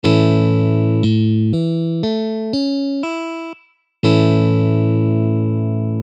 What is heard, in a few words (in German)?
A-Dur; pfadfinden